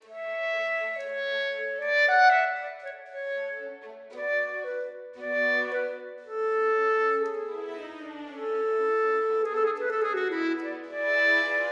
clarinet; Mozart; SM clarinets

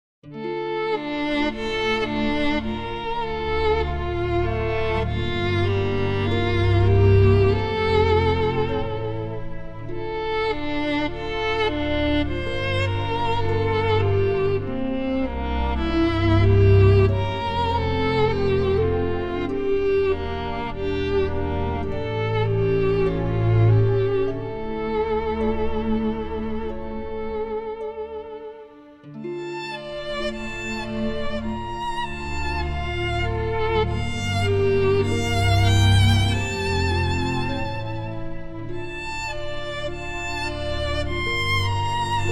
Violin; halion viola layered with vsl transitions

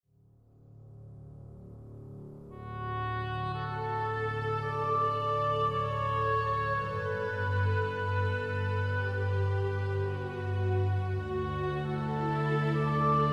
My oboe; GPO

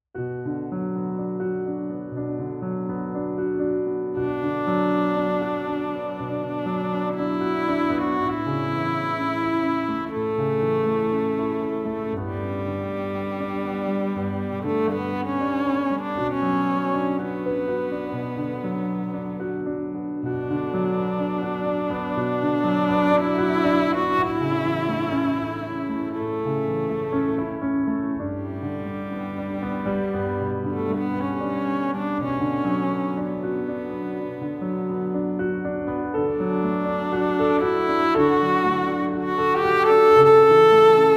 Cello; solo cello; Potter; swam

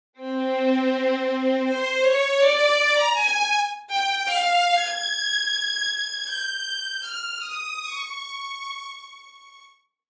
Violins dry; LASS; SPAT

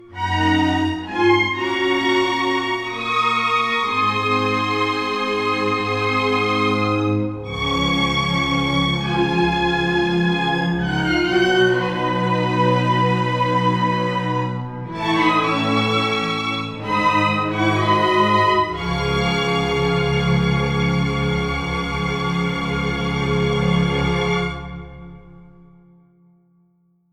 StereoRoom

